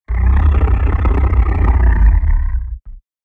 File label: Alligator tiger